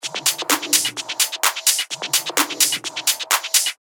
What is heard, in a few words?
128 BPM; Swallow